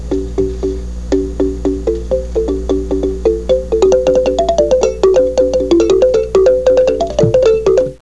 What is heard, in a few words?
Thumbs, Africa